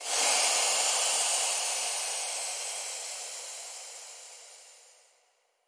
steam; ゆめ2っき

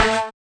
marimba steeldrum

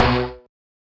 marimba steeldrum; ゆめ2っき

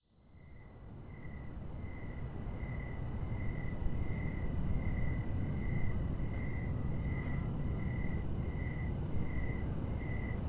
Nature

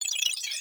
transformation

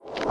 Reverse Step

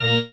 marimba grave; ゆめ2っき

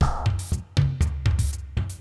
tom; ゆめ2っき

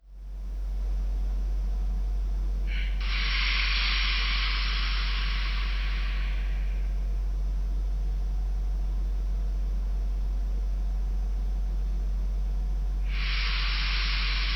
Steam sound; ゆめ2っき

